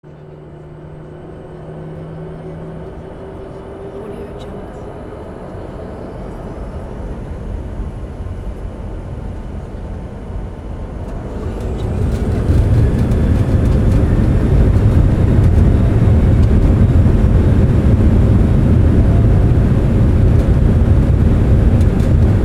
passenger jet takeoff

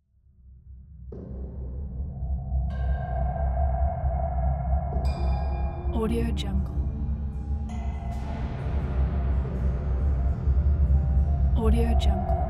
suspenseful tension builder background

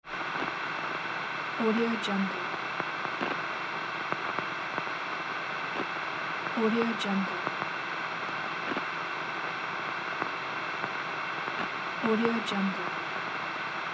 scanner radio static noise

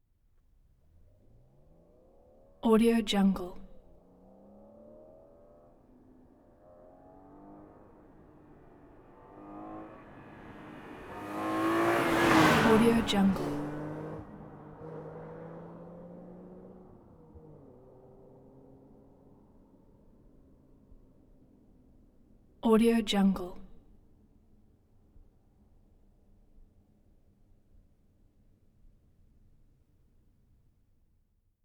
2002 bmw m3 car uphill pass by; prosoundfx

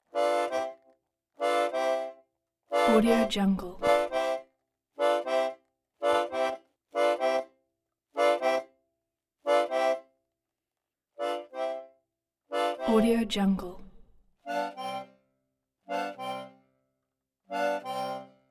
concertina musical instrument

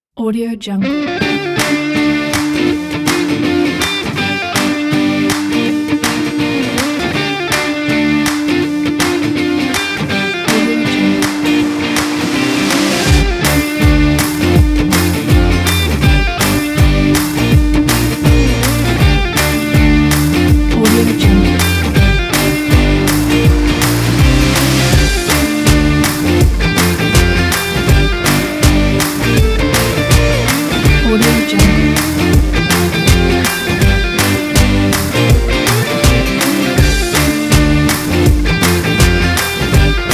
rock indie guitar

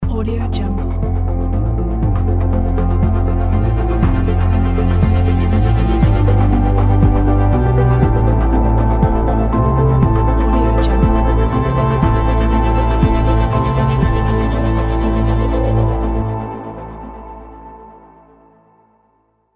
electronic opening logo

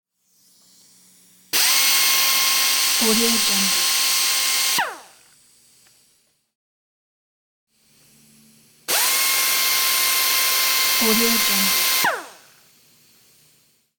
pneumatic air tool

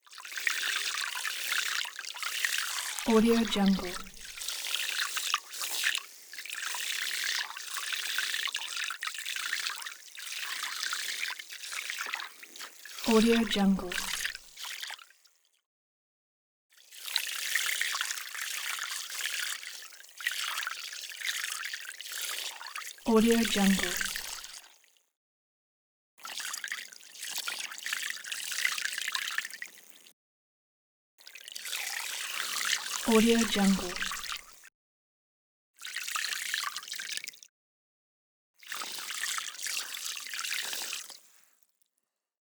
water milk mixer agitation; soundmorph

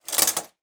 shower curtain close; prosoundfx

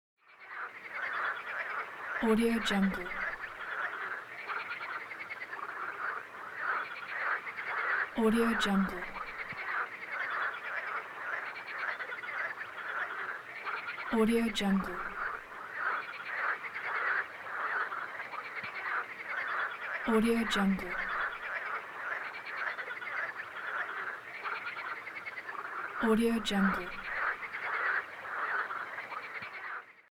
frogs

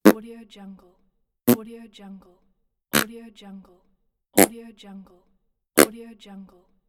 fart

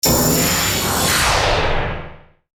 harsh inhale zinger; sound ideas

